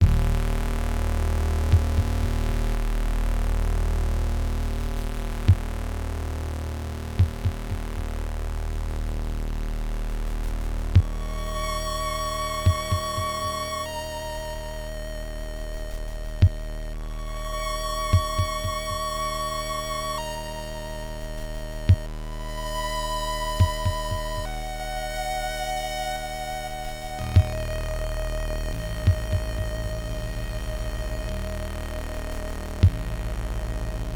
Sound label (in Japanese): 悪夢 (FM音源版)